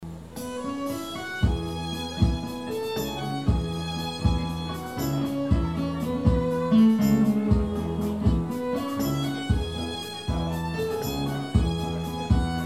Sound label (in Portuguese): Piano e Cordas